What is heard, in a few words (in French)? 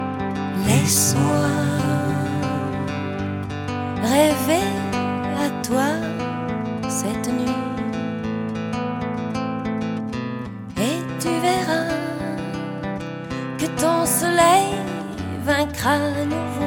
chant